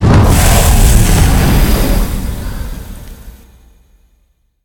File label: Ring Dissolve; Bahro